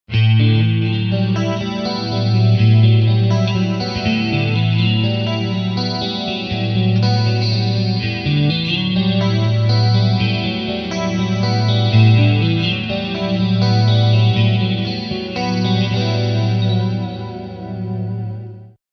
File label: Clean Delay Ballade